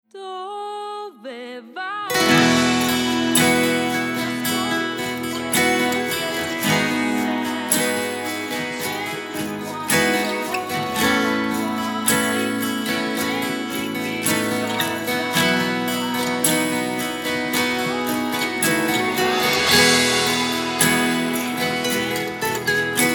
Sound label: ACU PERCS